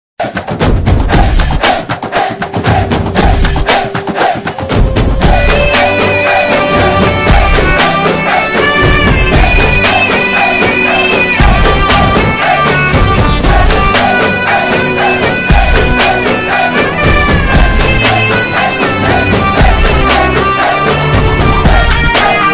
song bgm phone ringtone; raavanan; mass

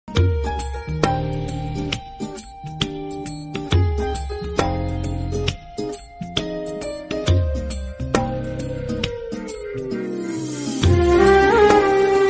romantic ringtone bgm rock

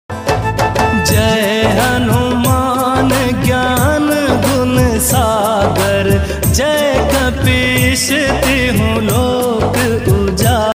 hanuman chalisa bhakti gana; jai bajrangbali

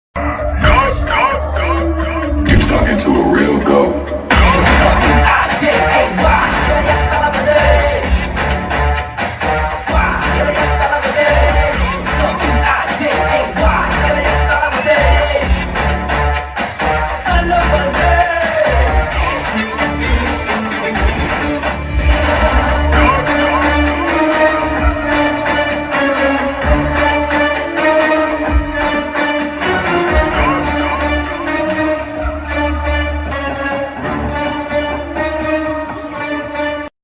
villain bgm; ilayathalapathy

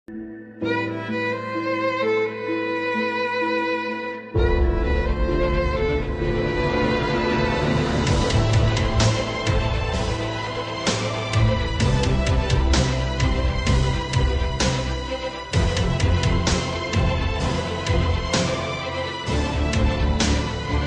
free fire ringtone old memories

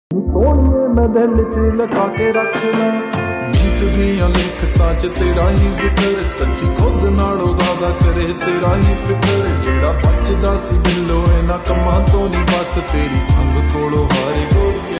slowed reverb, punjabi song ringtone; sonam bajwa

